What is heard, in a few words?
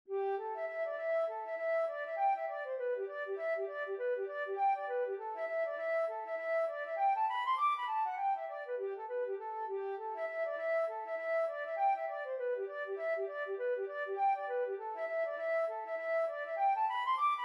reel, flute